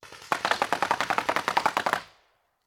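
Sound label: Paintball Gun Impact; Sound Ideas; Guns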